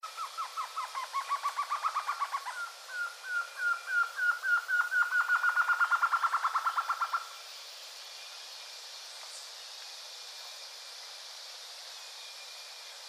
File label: White-tailed Trogon Trogon chionurus